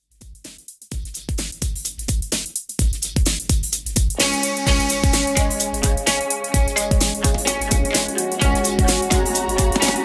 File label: 90s Classic Redrum 128 Bpm Clean